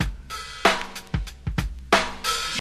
125 bpm hq breakbeat sample g key, Free breakbeat - kick tuned to the G note.; drum loop